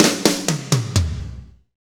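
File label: slow shuffle, Roland drum beats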